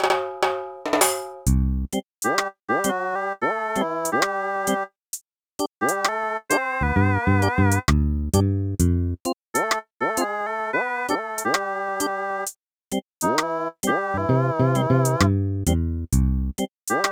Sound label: Reggae, Aircheck, Hi-Fi